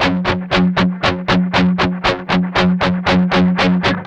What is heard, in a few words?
8TH G 2, Guitars; Spectrasonics; Hans Zimmer Guitars VOL-1; 128-JACKHAMR